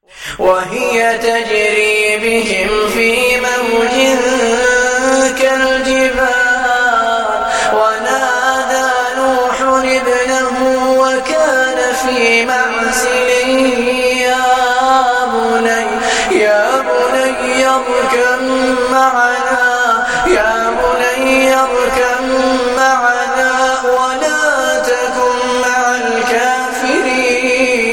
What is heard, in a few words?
Quran